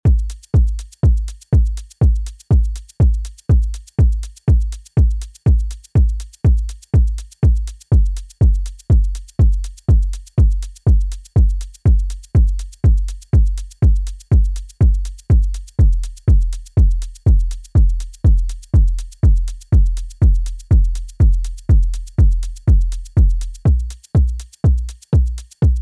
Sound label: Kick Moog Sample Tuning; How to Techno